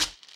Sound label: Snare Perc; Drums; Ableton Live 9